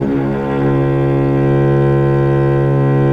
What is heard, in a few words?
C#1, VIOLE, Historical Instruments; USB Soundscan vol.23